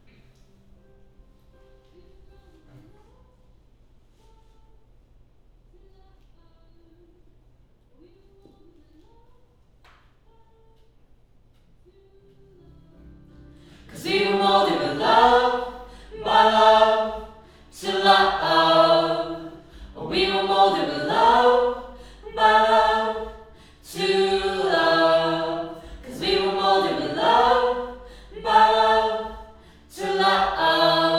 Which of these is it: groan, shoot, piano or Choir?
Choir